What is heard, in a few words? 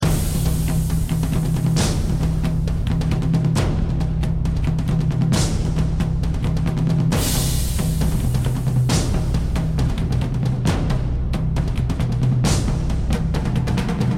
Samurai Percussion; Muzyka i dźwięki z gry; Fantasy Fights I